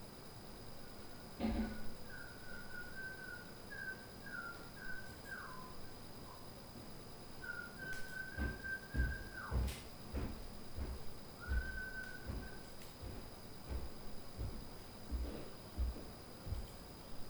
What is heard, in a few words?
Stair Noise